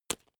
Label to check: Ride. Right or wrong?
Wrong